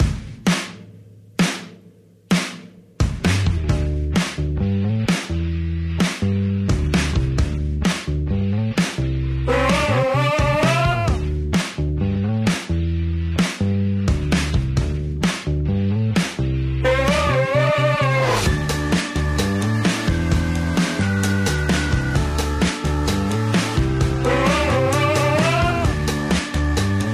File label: No Lead Vocals